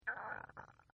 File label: Stomach Growl; Partners In Rhyme